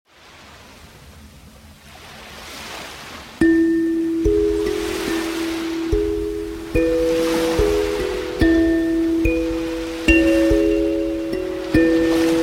Pure Relaxation with Ocean Waves

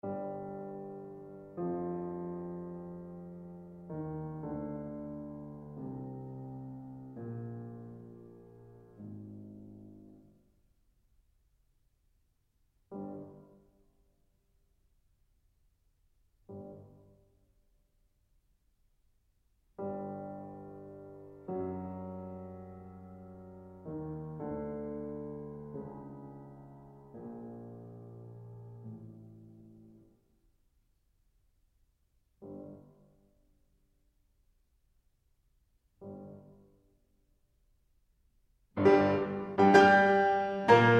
Piano Sonata in B Minor

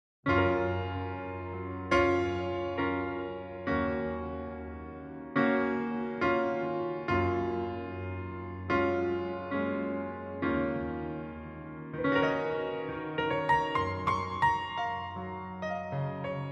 Jazz, Dinner Music

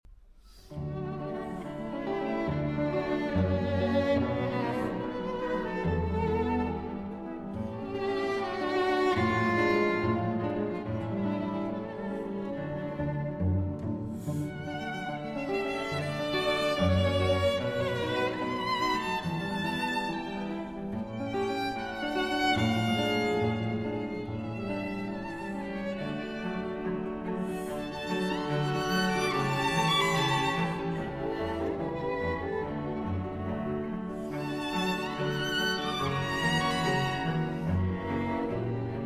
Quartet in D Major, Allegro Moderato

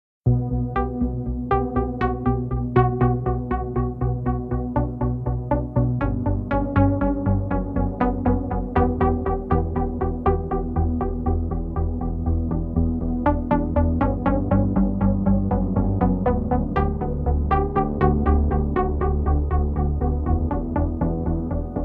Instrumental

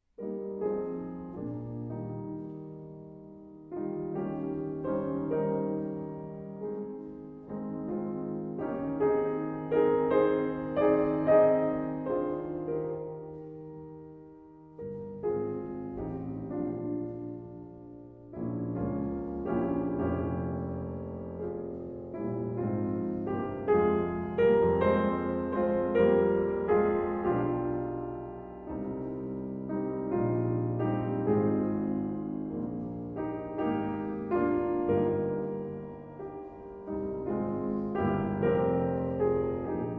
Trio in C Minor, Andante Espressivo

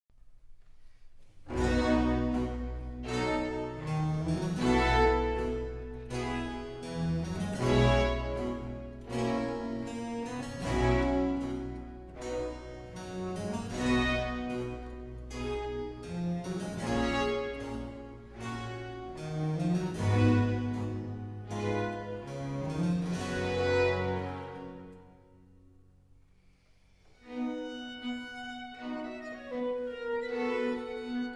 Violin Concerto in A Minor, Andante; BWV 1041

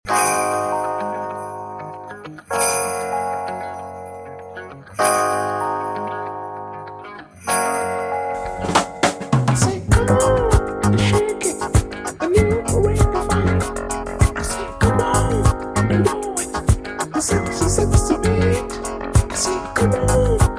Reggae Funk

